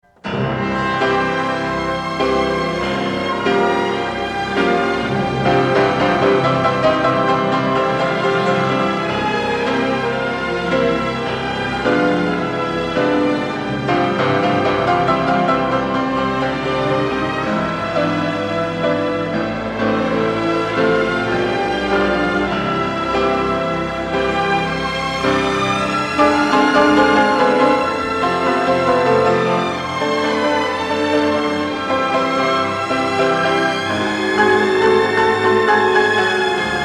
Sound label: piano concerto allegro scherzando; rachmaninoff; piano concerto 2